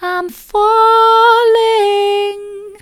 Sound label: i'm falling soft, vocal